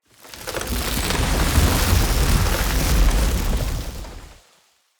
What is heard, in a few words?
Root Growth Braided Door Close; Puzzles; BeanStalk